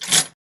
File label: equip; action RPG game; Adventures in Lestoria; Sig Productions